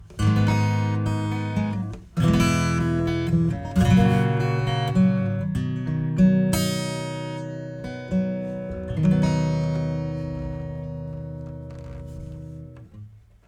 acoustic Pre Amp; Analog Pro

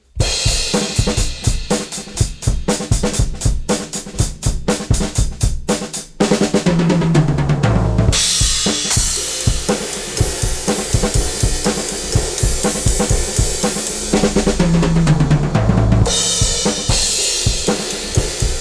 drums; Spectre

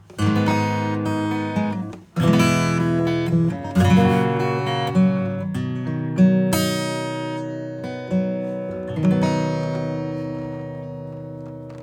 acoustic Classical

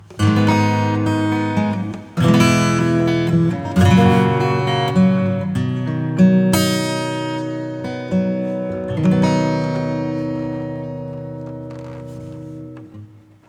acoustic Fresh Plate; XenoVerb

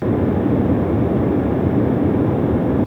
Nord Lead 4 noise

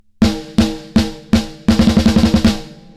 gate reverb decay; PHYSION

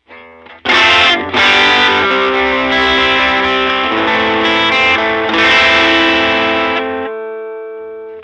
low cut; BASSROOM

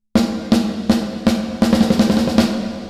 Roll off 24dB Octave 18.0kHz

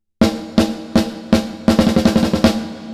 Reverb Snare; SP2016 Reverb

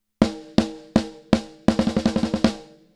Snare Transient Hit; Pulsar 1178

